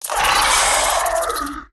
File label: Scarab Beetle Death